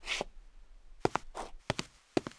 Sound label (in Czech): kroky; samkroky; Mafie 1